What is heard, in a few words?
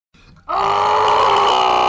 literally screaming; slr unused ost